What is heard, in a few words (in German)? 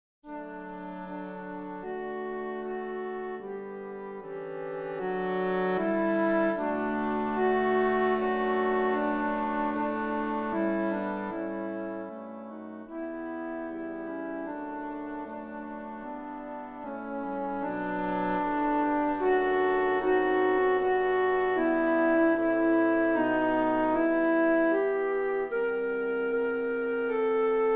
Floete